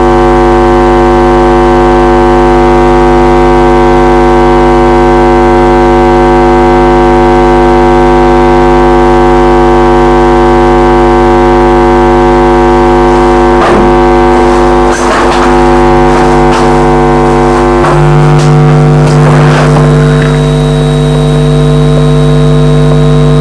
Your Power Supply Acting As A Transmitter recroding